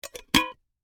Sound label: Coin Cup Cap Close, Foley, Coin, Cup, Cap, Close; Money; Objects; BlastWave